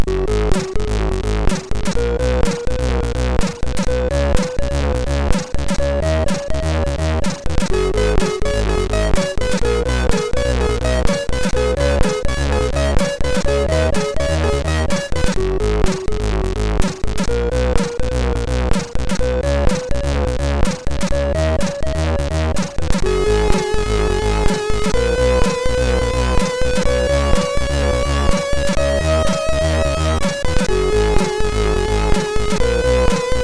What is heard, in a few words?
Voice, MOS6581R3 sid